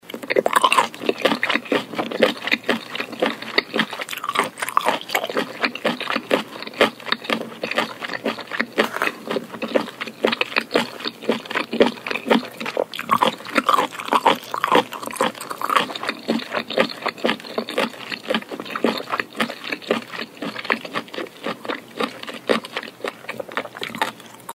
zvuk kushaniya ludey; Шум, издаваемый людьми во время кушанья еды

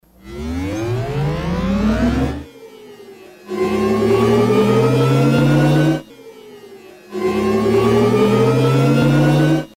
najatie na pedal gaza v elektromobile

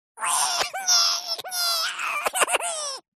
mult plach

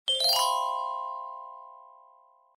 ideya zvuk

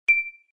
zvuk vklucheniya kamery

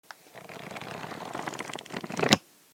skroling knigi

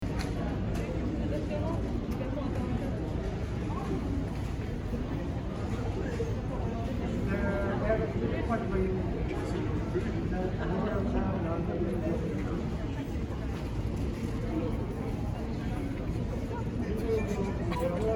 zvuk liudey ojydaiushih metro

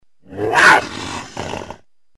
puma brosaetsya; рычания и шипения дикой пумы

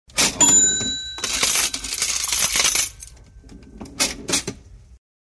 zvuk kassovogo apparata

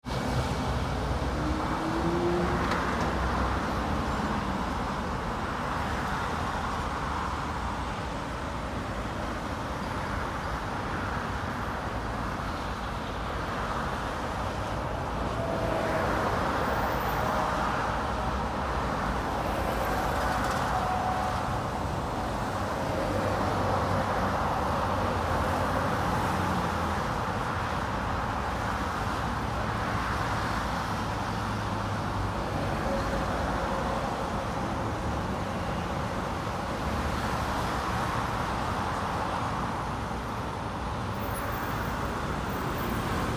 dorojnoe dvijenie

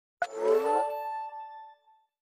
zariad xiaomi miui12.5

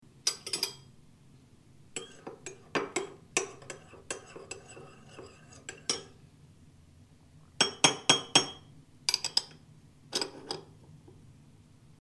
zvuk chainoi lojki

